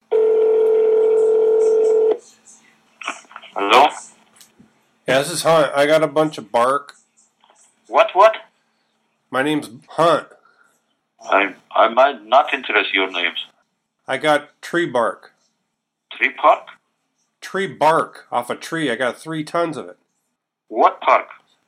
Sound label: Tree Bark